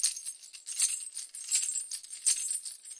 160 swinging shaker, drum loops and breaks; 141 to 160 bpm

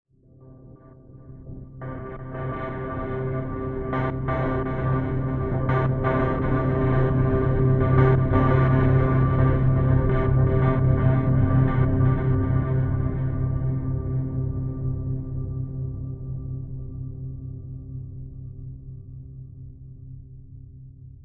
ambient void pad; Atmospherics

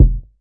kick, Classic Hip Hop; STYLE KITS; Classic Hip Hop Mega Kit

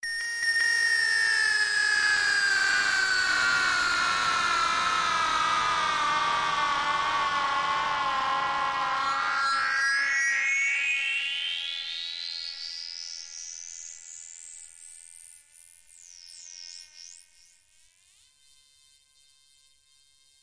alien guitar death cry; Atmospherics

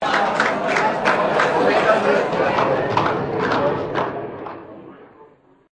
jazzclub applause; Various Strange Sounds and Effects

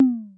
Korg ER-1 tom rock

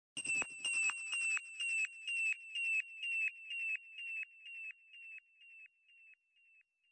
126 space bird; SYNTH AND ELECTRONIC LOOPS

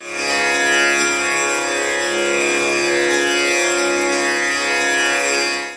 Tambouras Csharp2; Far East Drones and Plucks

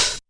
tekno hat; DRUMS (SINGLE HITS); Hats

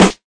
Snares

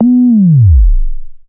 glide up down sub, jungle bass

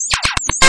three beat hatitis, drum loops and breaks

